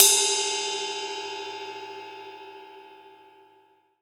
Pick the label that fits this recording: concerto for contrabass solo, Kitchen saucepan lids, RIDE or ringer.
RIDE